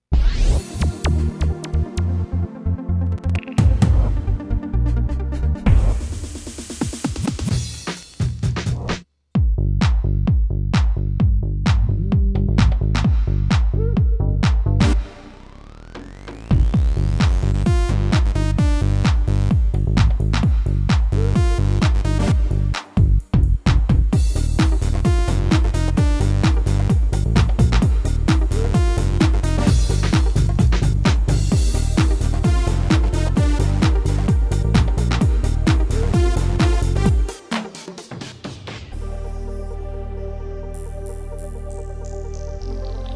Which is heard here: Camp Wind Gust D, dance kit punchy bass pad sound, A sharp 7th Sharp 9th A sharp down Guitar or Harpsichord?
dance kit punchy bass pad sound